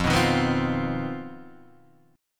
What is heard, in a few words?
C Minor Major 7th Double Flat 5th F, chord